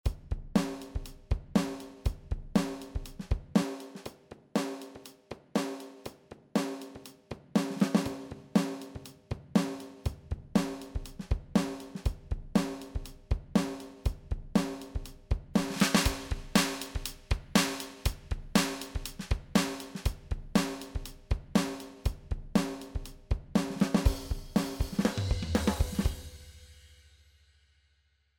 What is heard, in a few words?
MOOER Drummer EQ Settings Stereo Out